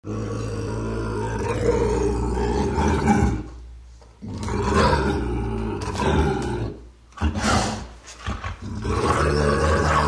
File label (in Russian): rysi golos